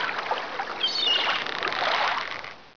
dock; Caesar3

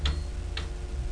tictac; Mosaïcon

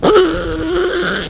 squeal; SimPiglet

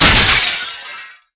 break glass; Spearhead Demo; MacFormat 2003 June

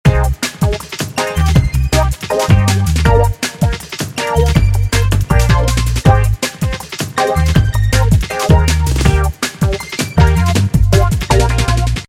electro; MOBICLIC 64

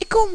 oooff; worms tools; better worms

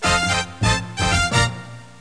tada; Megatouch Gold

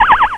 bonus; Ferazel's Wand Demo

